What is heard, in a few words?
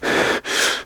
Fatigue Medium; 1916 der unbekannte krieg gamerip